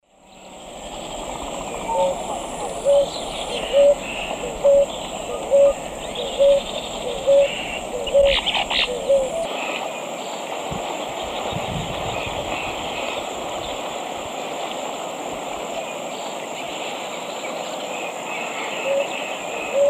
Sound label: Columbina talpacoti, song; S. America; Eastern Lowlands